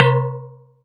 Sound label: Synth Bells; Dream Zone